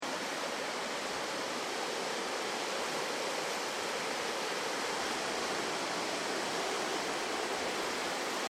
Ocean Beach Sound Of The Sea, Clear Waves; Nature; CraftedSignals

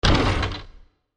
Small Impact With Dropping Elements; Blasts; Impacts; Crafted Signals

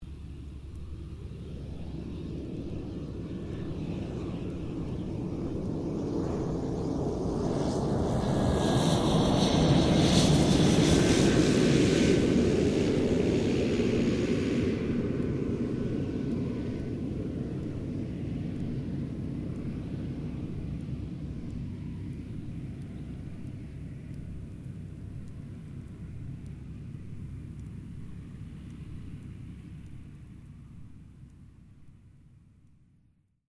AIRCRAFT DOUGLAS DC3 LAND; Qantas 1942 DC3; Airplane; Airplanes; Vehicles

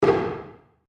Wood On Wood Floor; Material components for constructing more complex sounds; Sound Effects:Materials

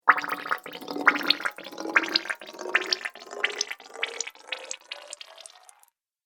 Water Bubbling Echoes, cartoon

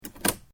Suitcase Lock Close; Travellers case; Lock Sound Effects; Locks (Sound Effects:Objects)

